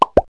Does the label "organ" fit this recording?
No